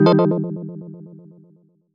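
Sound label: Fm chord; HausenStock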